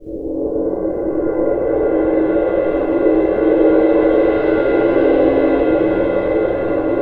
CYM ROL, Percussion; ILIO; Synclavier Percussion 2; World & Orchestral

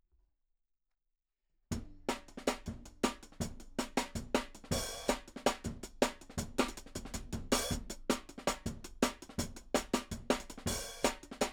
HIHAT, drum n bass; JUNGLE BEATS; BLIND MAN RELAPSES INTO HARD BEATS TILL HE SEES THE LIGHT AGAIN; RAW MULTITRACKS

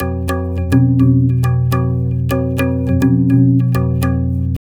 Tabla, 105 BPM; Zero-G; Pure Tabla

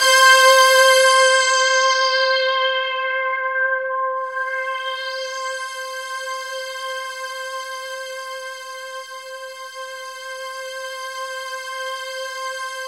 7TH-WSTRG, KURZWEIL K2000

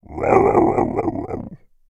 DOG BARK, VOCAL FX; Spectrasonics Vocal Planet; Jazz FX

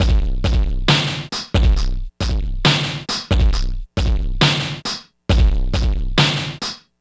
FX kit, beats; Sonic Foundry (Sony Creative Software); World Pop

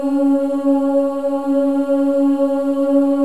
OO C#4, WOMEN; Spectrasonics

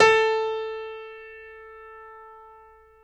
PNO Grand Pno; Club-50 - Foundations Roland

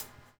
BUBBLE; Spectrasonics - Backbeat

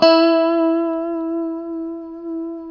E JC120; Guitars; Spectrasonics; Hans Zimmer Guitars VOL-1; JC-STRAT MF

